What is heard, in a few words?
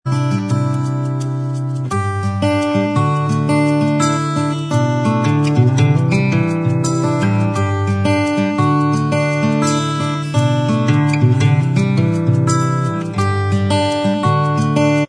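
acoustic instrumental track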